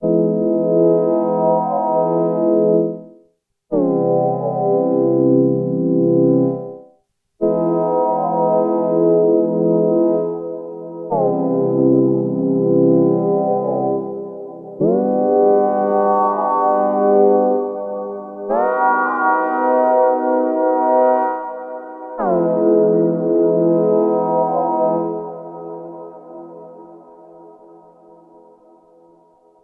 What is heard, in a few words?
KORG Organic Chord; KORG Hollow